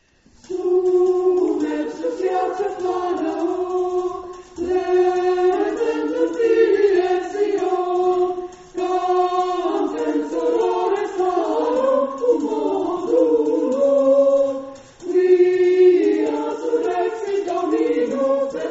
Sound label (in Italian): coro